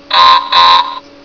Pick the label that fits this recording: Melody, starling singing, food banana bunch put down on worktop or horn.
horn